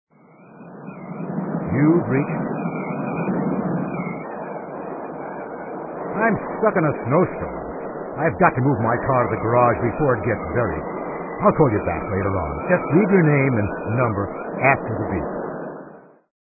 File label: Snow Blizzard